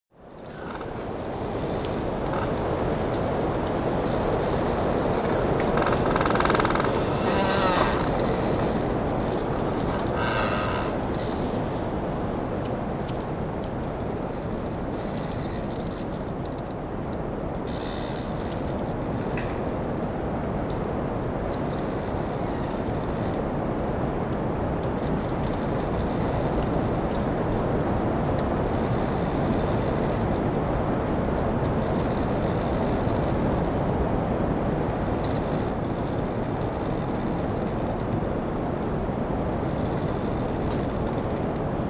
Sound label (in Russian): veter v bolshih derevyah skrip